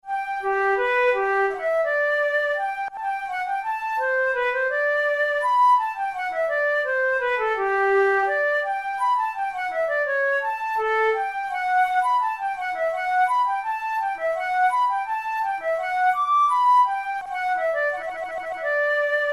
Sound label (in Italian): capricci per flauto traverso; ruge